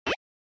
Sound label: Retro Anime System High